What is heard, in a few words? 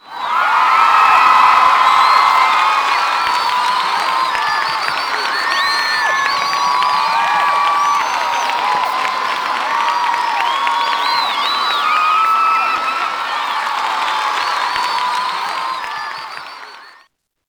Scoreboard cheer; LittleBigPlanet 2; PS3